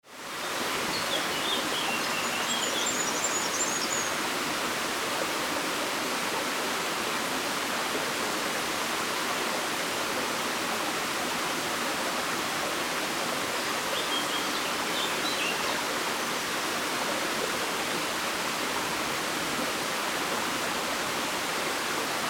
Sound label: river atmo birds background